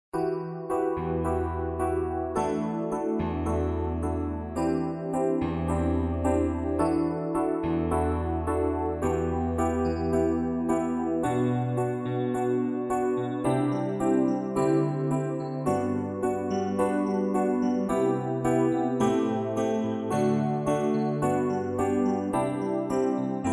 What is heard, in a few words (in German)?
nur Begleitung